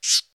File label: Leech